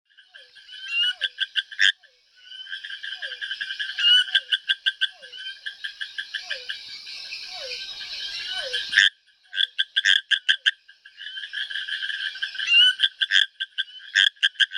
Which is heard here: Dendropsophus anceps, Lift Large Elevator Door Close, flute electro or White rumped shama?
Dendropsophus anceps